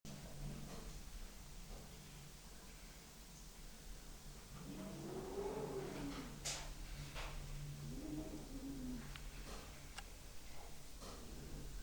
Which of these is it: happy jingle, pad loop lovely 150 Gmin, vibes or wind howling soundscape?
wind howling soundscape